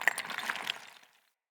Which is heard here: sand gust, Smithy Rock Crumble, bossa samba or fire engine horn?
Smithy Rock Crumble